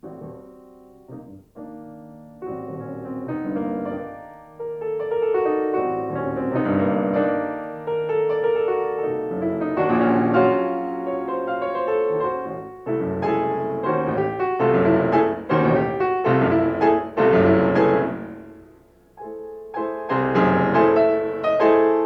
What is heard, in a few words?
Pieces for piano; Scriabin